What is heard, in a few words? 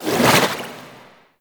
Water Tomb Cast; Warframe; Yareli